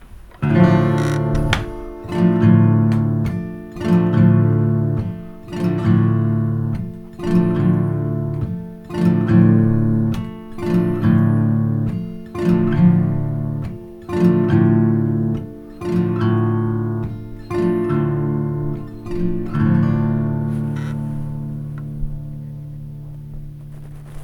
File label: Bending Drill Dm Chord